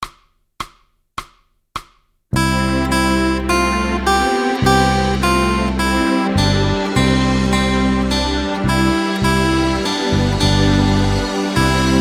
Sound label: guitar for kids; hal leonard